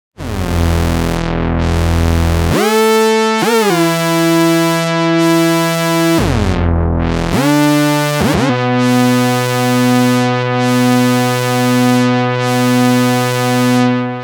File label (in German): Semi Modular Glide unterschiedliche Zeiten für beide VCOs